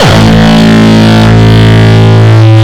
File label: Longkick, kicks